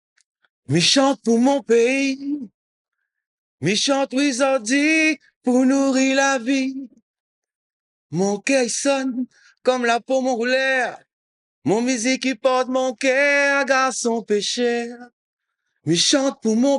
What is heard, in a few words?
A Capella